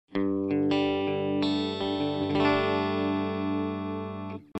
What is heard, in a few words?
Electro-Voice ND967 Clean (Bridge+Neck), guitar